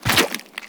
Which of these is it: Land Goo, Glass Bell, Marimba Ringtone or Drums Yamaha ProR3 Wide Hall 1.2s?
Land Goo